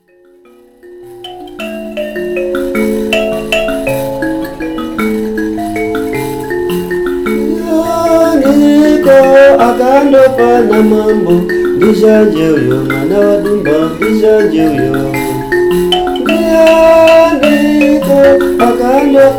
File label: mbira